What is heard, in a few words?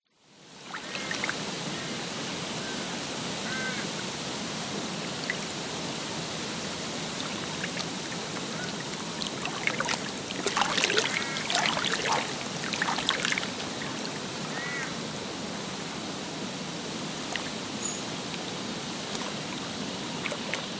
nature lake ambience gentle waves lap shore wind reeds trees distant birds; zapsplat